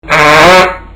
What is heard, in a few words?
fart